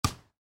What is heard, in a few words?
Volleyball (Exterior): Block Soft; Miscellaneous Sports; Stuart Duffield